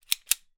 gun tokarev semi automatic pistol cock

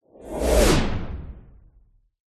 magic spell whoosh powerful energy pass electric; fantasy

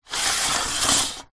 industrial joist metal small sliding extend

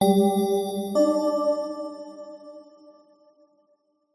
notification tone bell x2 ascend fifth positive; Skyclad Sound

